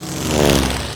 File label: airplane select; Pacificwar